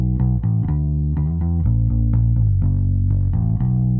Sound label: bass; musdb18